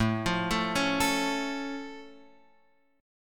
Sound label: A Augmented A, Guitar Open F; arpeggiated; JGuitar; x,4,5,4,4,4